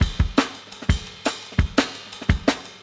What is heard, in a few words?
170 Popper Clean, Live Jungle Breaks; Badass Popper